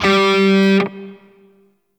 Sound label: Shawzin Oct Two G; Warframe; Grineer Shawzin